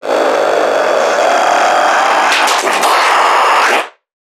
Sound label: Creatures Vocalisations Infected; Five Nights At Akers; soma; npc construct